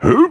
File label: Huh; Harpoon